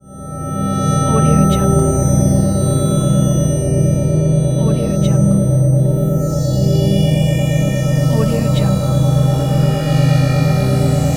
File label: futuristic ambient